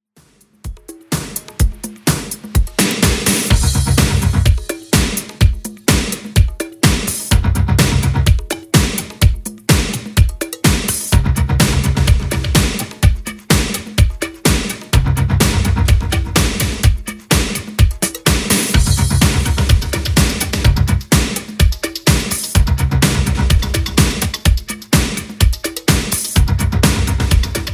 80s Redrum 126bpm

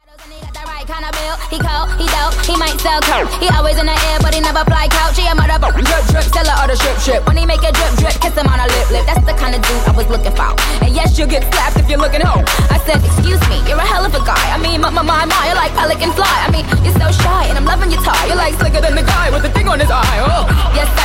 Word Play Segue 127 Bpm

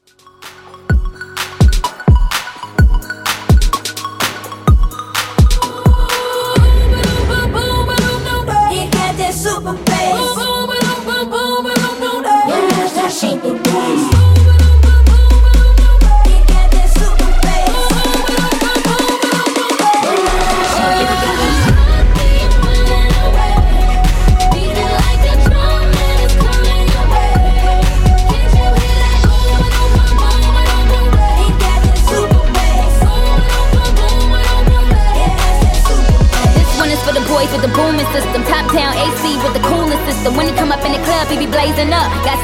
Epic Intro

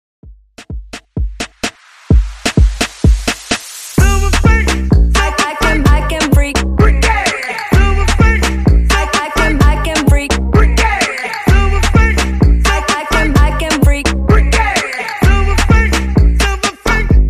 Top40 Hype Mashup

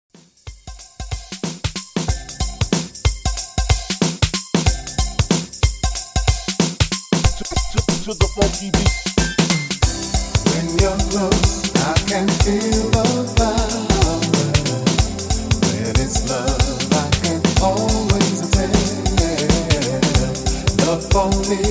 90s Extended Classic 93Bpm

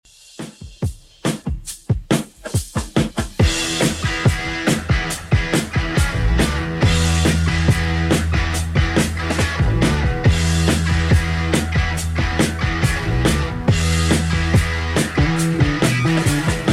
60s Redrum 140bpm; remixplanet

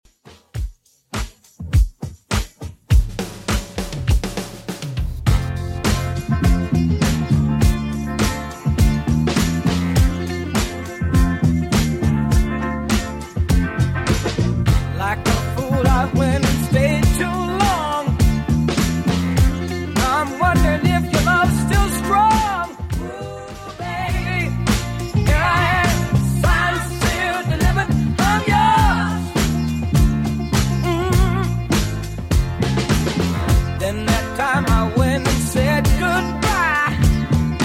70s Redrum 102bpm CLEAN

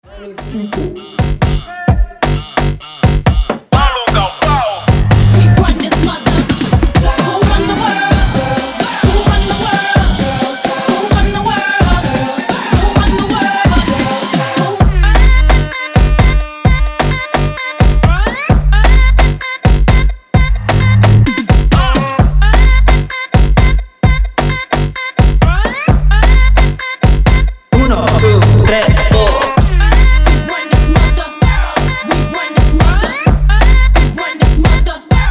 baile funk breaker 130 bpm

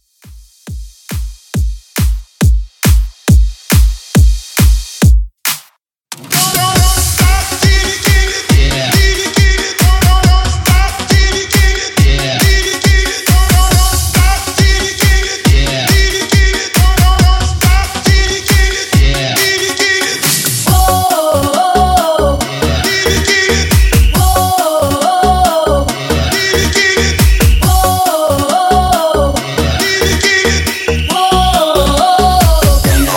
Party 138 Bpm; 90s; ReDrum

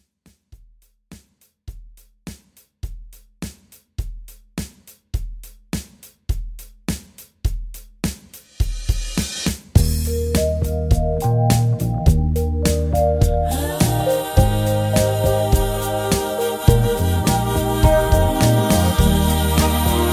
Classic ReDrum 104Bpm

